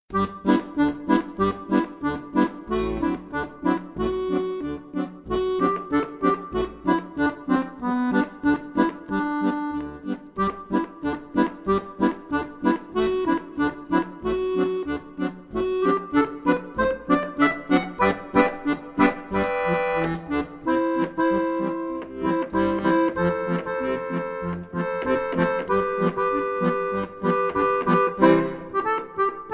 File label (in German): Fox